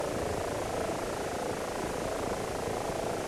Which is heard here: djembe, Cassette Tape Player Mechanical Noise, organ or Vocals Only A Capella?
Cassette Tape Player Mechanical Noise